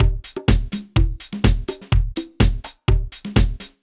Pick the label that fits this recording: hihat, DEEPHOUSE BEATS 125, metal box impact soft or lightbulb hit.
DEEPHOUSE BEATS 125